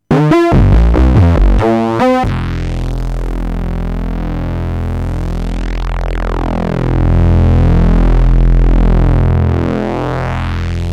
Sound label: 3 VCO live Sequ Trig FM VCF; GRP A4